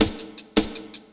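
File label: loop snare drum, 160 BPM, Drum & Bass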